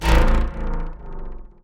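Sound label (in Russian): звук затухания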